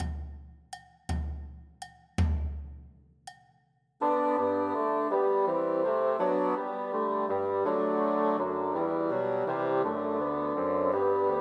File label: Sussex Carol Christmas Trios for Three Bassoons